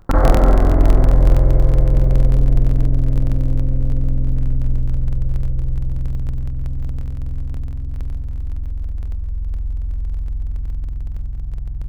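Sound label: Clavichord; Synthetic's Serum Collection; Echo Sound Works Sphere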